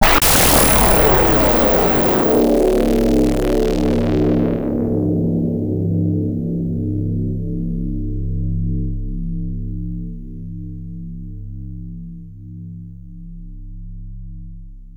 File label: Explosion; Spindizzy II; X68000